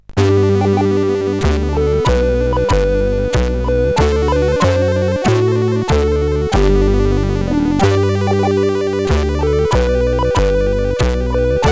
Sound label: German Xmas tune sid CSG8580R5; hvsc